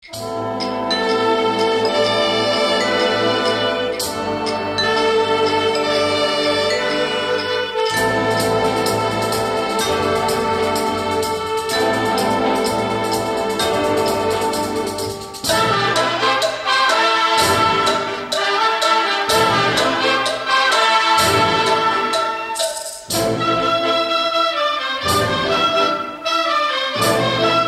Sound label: Atmospheres, Instrumental